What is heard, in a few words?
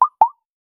cute dating app notificat, bubble